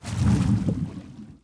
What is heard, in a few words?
under swish